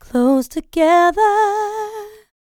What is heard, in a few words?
LICKS, Vocal R&B Dance; LT DANCE